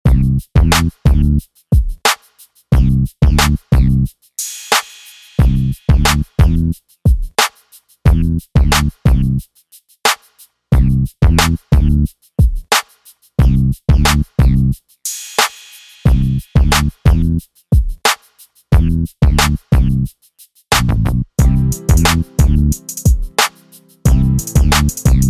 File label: gangsta funk instrumental